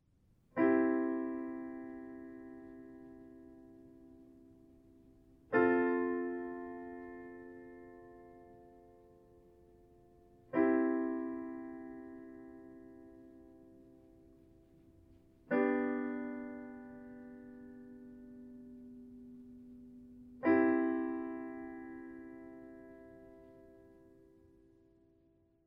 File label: Alternative minor chords